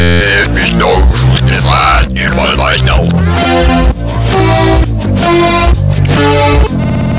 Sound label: noisy